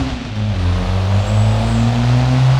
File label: second, vehicles; vswat